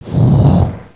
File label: fire; mordor